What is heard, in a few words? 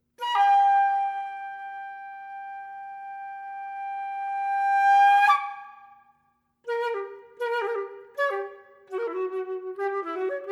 Spirit Man For Flute